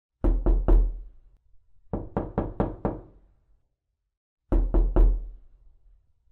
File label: knocking loop